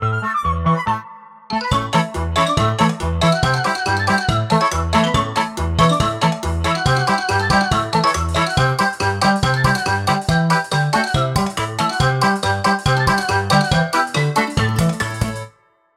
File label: Outro; Angry Birds